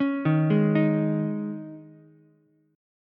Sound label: C sharp Suspended 2nd, C sharp, Ukulele; C#sus2; arpeggiated; JGuitar